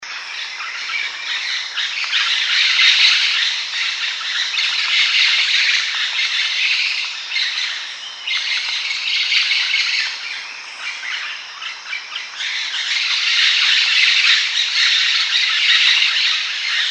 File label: Red-eared Conure